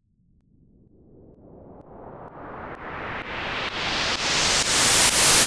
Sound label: progressive house noise riser; 6 seconds